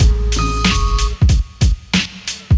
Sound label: Boombap drum loop